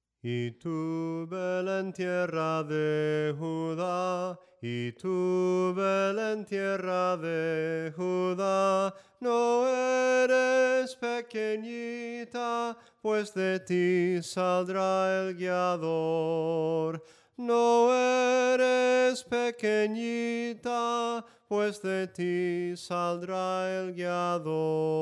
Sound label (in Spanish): SOPRANO CANTADO, himnos en melodia soprano